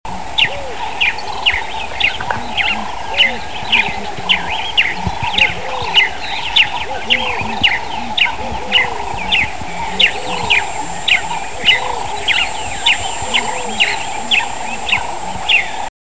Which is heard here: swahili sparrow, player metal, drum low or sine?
swahili sparrow